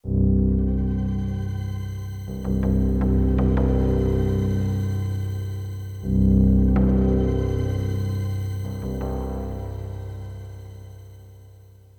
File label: SYNTHPAD AMBNT 160 C; eJay; Ambient; Sphere